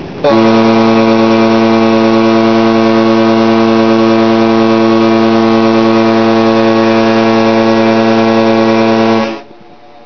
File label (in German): Horn